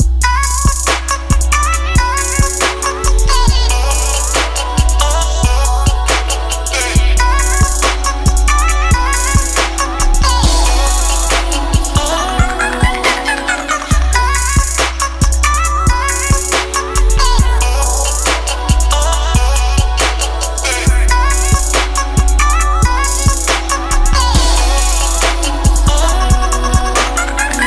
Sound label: Chill Trap